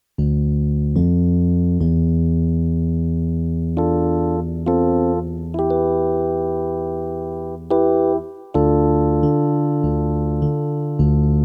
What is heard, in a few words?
piano; MegaEpix